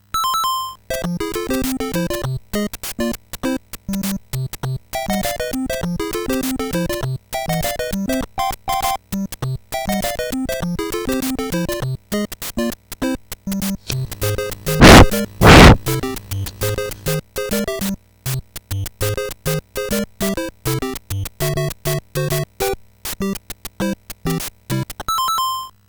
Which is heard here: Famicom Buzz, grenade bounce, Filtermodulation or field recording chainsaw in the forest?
Famicom Buzz